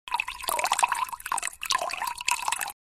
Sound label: Pouring water from plastic bottle into glass